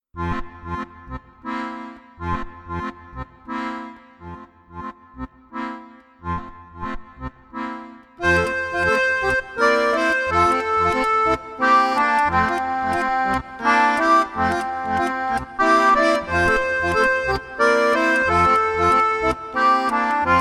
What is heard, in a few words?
Jazz Waltzing Duet